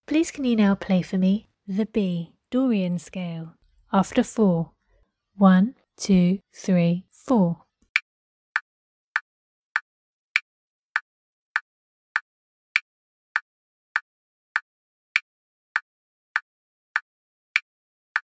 B dorian scale

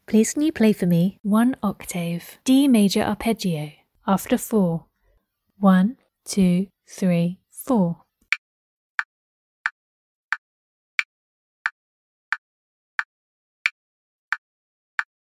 d maj arp

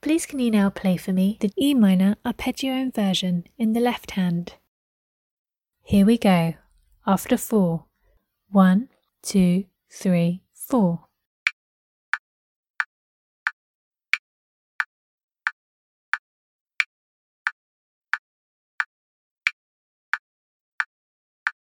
e minor arp inv lh

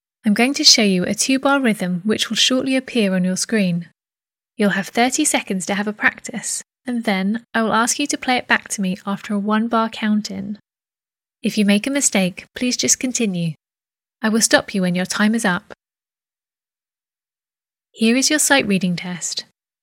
Guitar Sight Reading; Orange; Exam

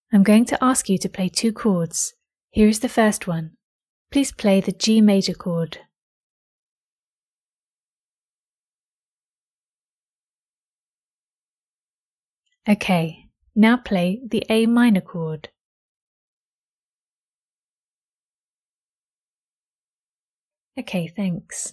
Chords G Maj A min

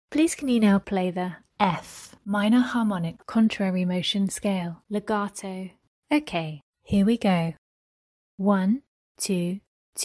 F min harmonic cont mot scale legato

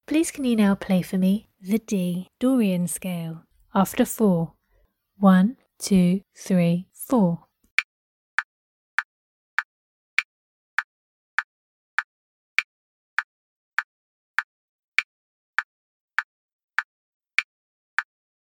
d dorian scale

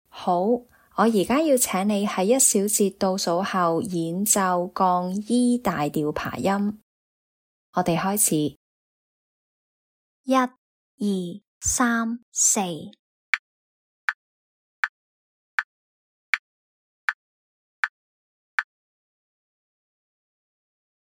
E flat maj arp

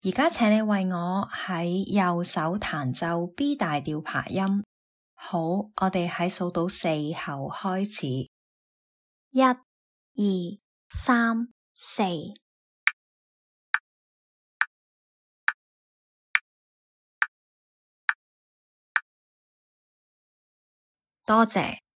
b maj arp rh

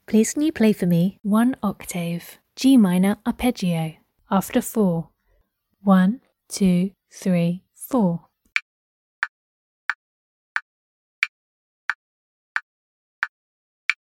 g min arp

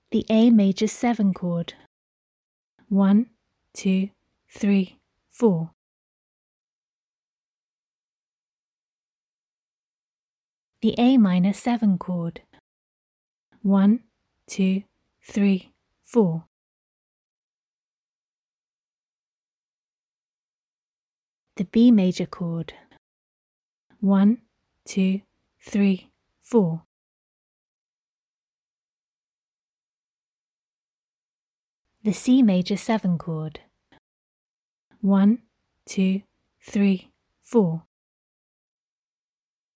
all chords; orange

